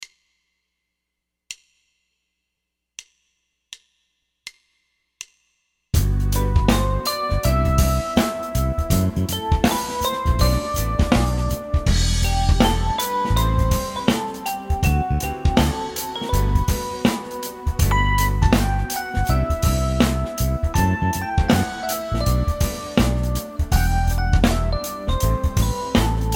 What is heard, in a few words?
playalong cadenza